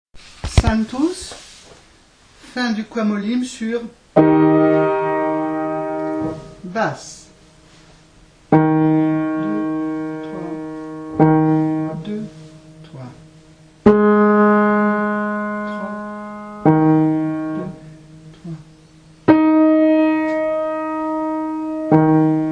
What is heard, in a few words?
Sanctus, Basse; Niccolò Jommelli, Missa pro defunctis; 16. Sanctus